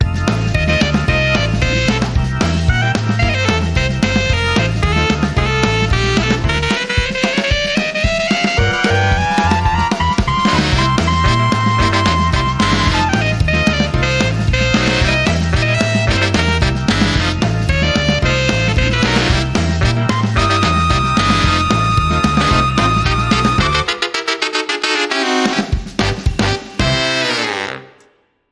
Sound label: Sax